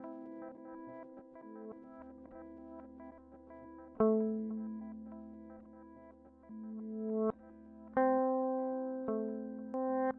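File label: Avalanche Run V2 Stereo reverse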